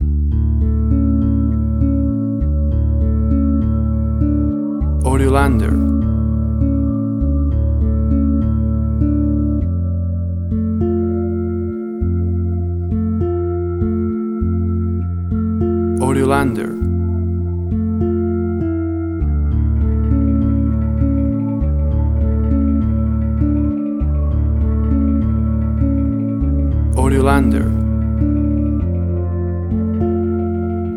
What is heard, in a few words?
Essentially a Loner 100bpm